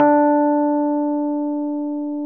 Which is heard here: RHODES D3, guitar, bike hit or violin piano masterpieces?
RHODES D3